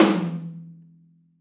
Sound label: Drums Percussion, Kit1 Room Mic